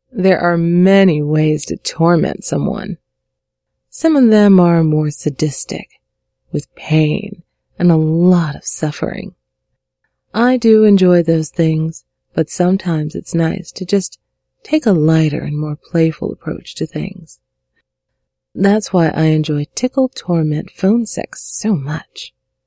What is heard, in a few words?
Tickle Torment I Tickle You Giggle